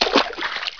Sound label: step water; SpecOps2 MPlayer Demo